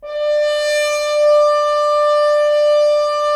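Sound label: Solo French Horn, brass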